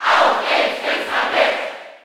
Cheer German; Dark Pit; SSB4

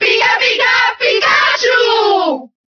Cheer Italian; Pikachu; SSBB

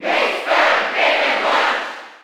Cheer German; Mr. Game & Watch; SSB4

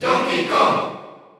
Cheer Dutch; Donkey Kong; SSBU

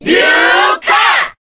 Cheer Korean; Lucas; SSBB

